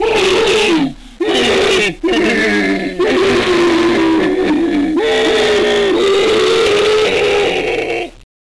Fire death; Cruelty Squad

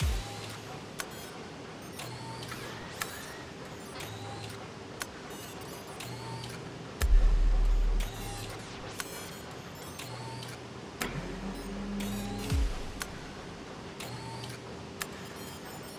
Ambience Factory 32 beats 120bpm

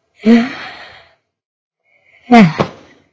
Lights out sleep

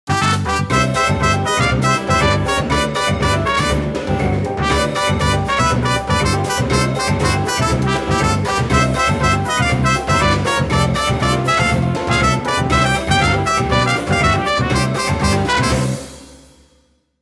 pachinko fanfare long

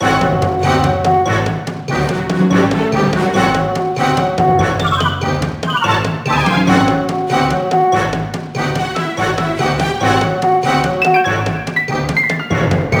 Battle; mercenaries wings the false phoenix

